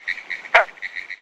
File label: Frog Green Tree Call